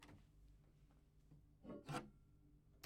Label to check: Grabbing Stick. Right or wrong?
Right